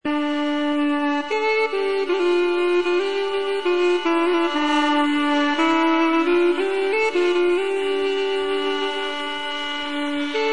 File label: duduk, Armenian musical instruments